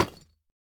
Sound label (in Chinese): 深板岩砖放置声